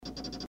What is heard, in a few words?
fast 5 clicks